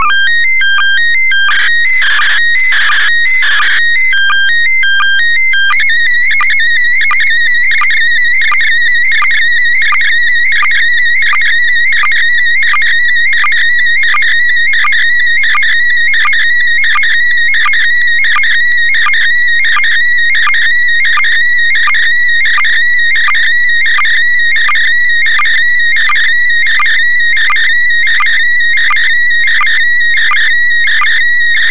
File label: sstv; VK4MSL